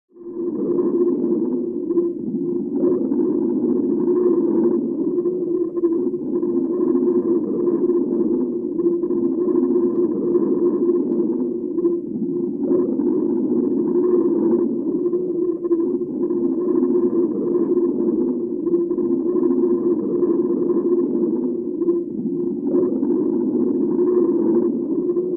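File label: Classic Sounding Eerie, bubbles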